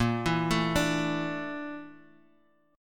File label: A sharp Major 7th Flat 5th; arpeggiated; JGuitar